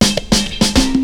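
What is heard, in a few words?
snare roll; BEAT2000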